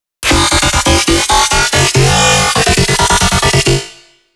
C loop, bass

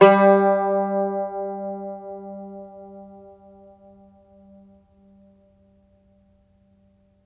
IRISH BAZ, Plucked Strings, Irish Bazouki; E-MU Producer Series Vol. 7 – Old World Instruments

